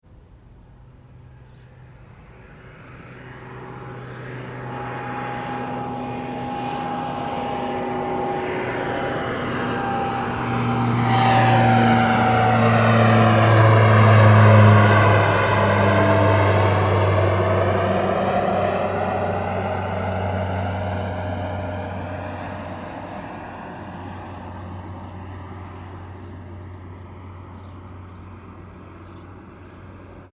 transportation aircraft commercial propeller pass low external; Aircraft - Large Jet Airliner Fly Overhead Very Low; Airplanes; Vehicles; McKinney Sound